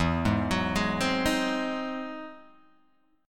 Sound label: E Augmented Major 9th, Guitar, Open G